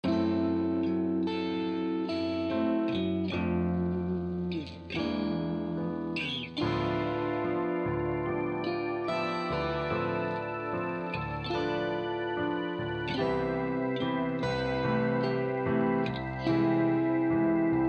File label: ballade arrange